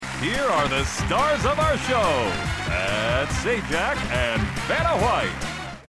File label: intro ringtone